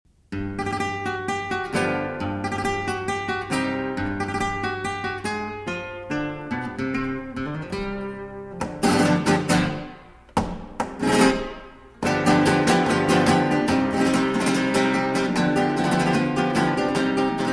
Farruca y Rumba

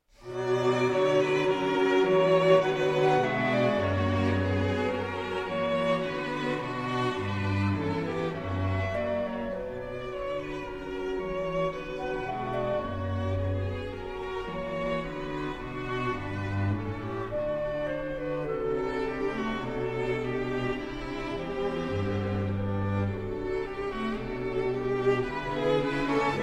Clarinet Quintet in B Minor; Op. 115; Brahms; IV. Con Moto

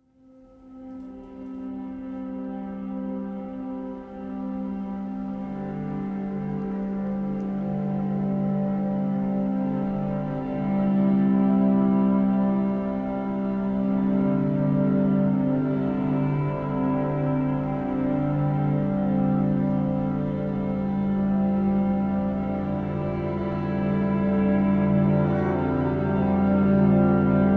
String Quartet

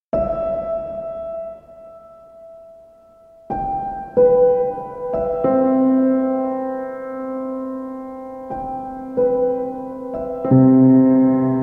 Piano Sounds

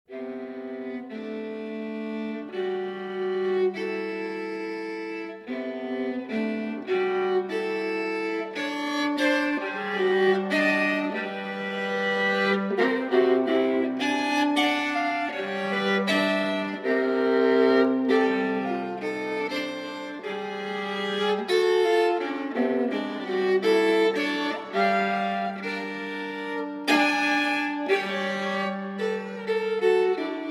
Sonata For Viola Solo II. Loop

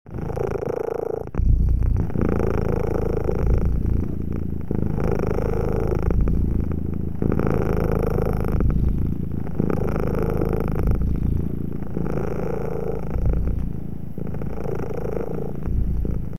Cat Purring Raspy & Breathy (Loopable)

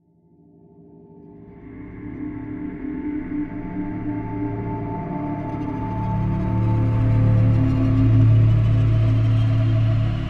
Choir Practice

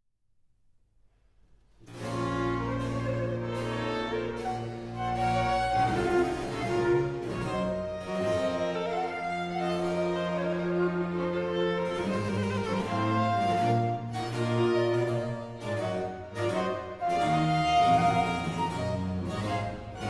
Orchestral Suite No. 2 in B Minor, Ouverture

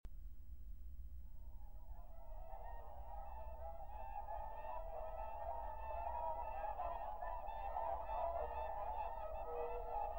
Concerto For Birds and Orchestra; Cantus Arcticus, Op. 61

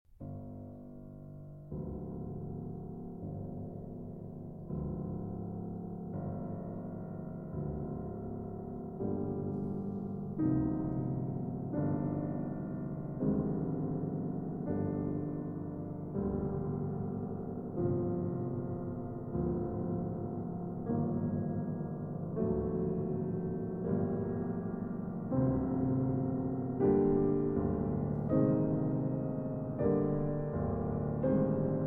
Variations on a Polish Folk Theme, Piano Works; Szymanowski

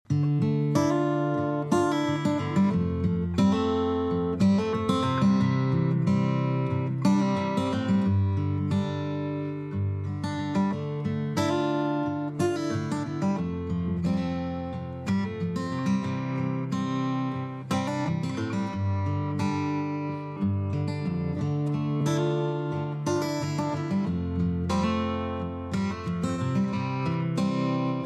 Karaoke Version; Eurovision 2022 - Netherlands

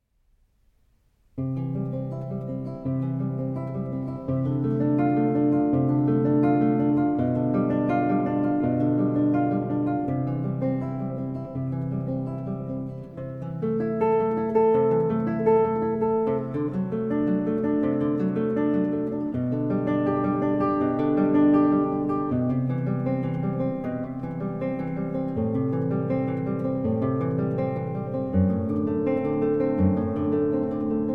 Prelude & Fugue in C Major; J.S. Bach; The Well-Tempered Clavier, Book 1; BWV 846

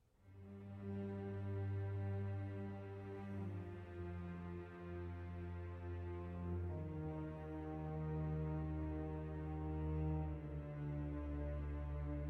Chaconne in G Minor

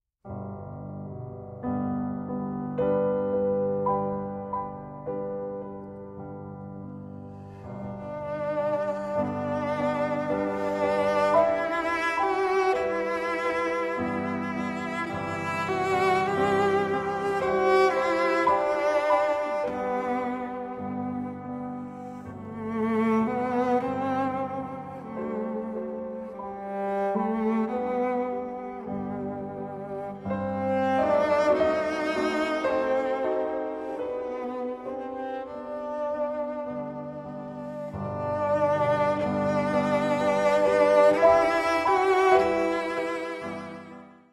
Cello; Arr. for Cello and Piano

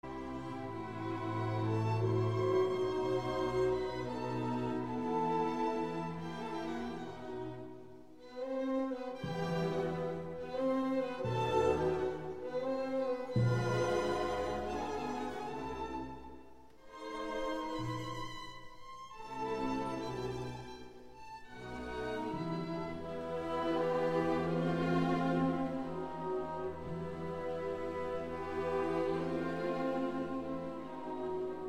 Guitar Concerto in A Major, Andantino Siciliano

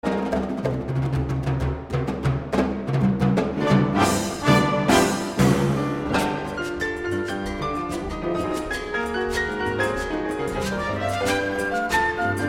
Guaracha y Mambo; Nazareno III.; Arr. Gonzalo Grau

